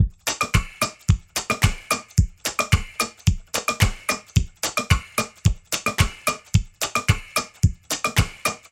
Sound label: Foley Loop 110BPM, Drums; Antidote Advent